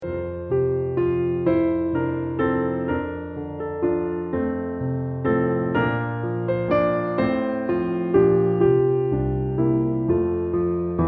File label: Waltz